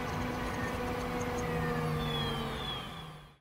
shutdown

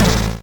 bullet hit; boss

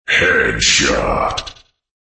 headshot

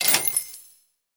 purchase Done; Tower Crash

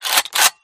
usas switch, guns; resurviv

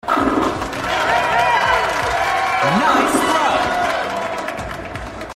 Wii Strike

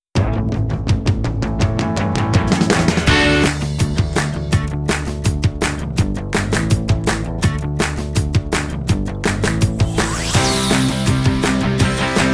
music splash; umizoomi quiz

